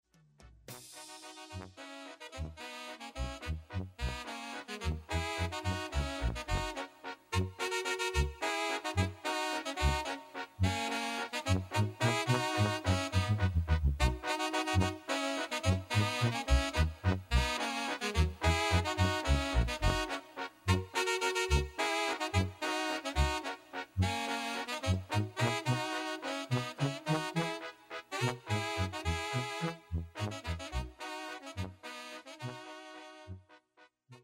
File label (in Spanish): acordeón